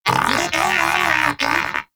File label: Moa Pet Player Down; Warframe; Characters; Pets; Tenacious